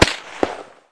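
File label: ricochet; valve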